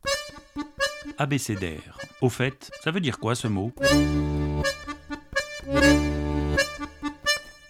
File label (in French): jingle abecedaire